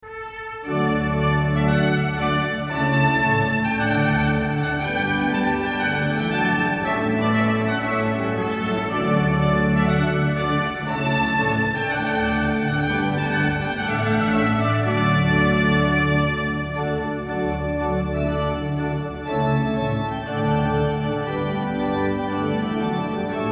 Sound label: Te Deum, organ trumpet; charpentier